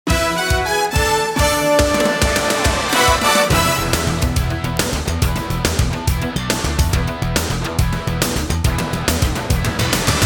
电视包装音乐

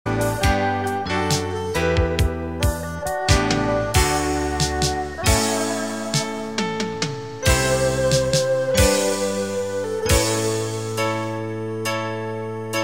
广告片音乐

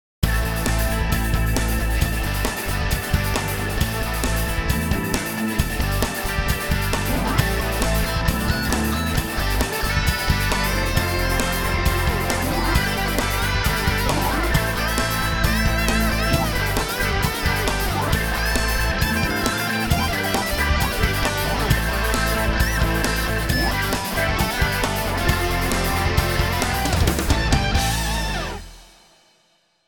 片头音乐

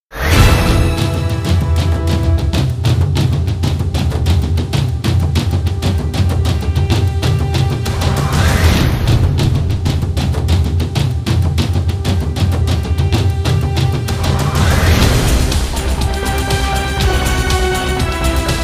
片头音乐

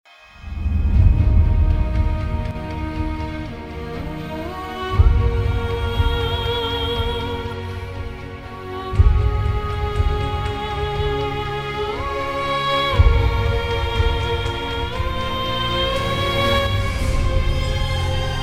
大气的背景音乐

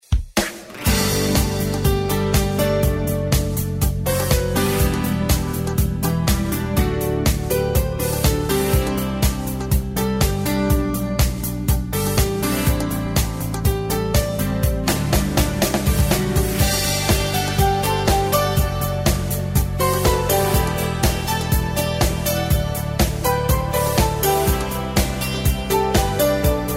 专题片背景音乐

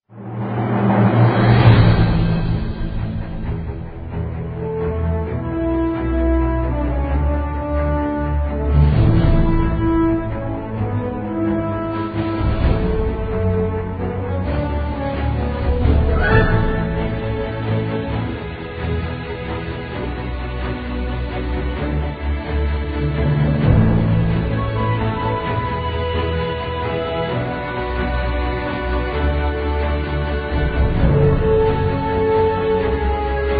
专题片背景音乐

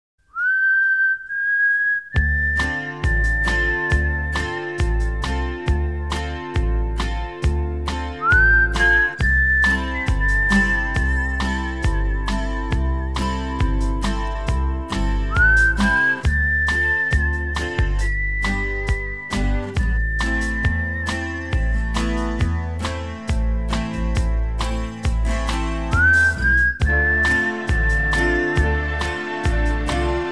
纪录片背景音乐

